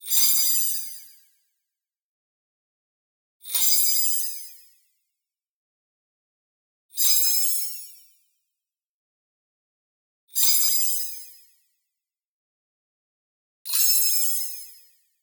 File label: BLING High Light Tonal Breeze; boom library; Anime Essentials